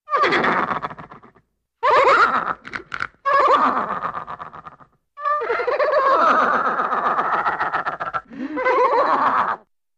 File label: Horse Laughing Evil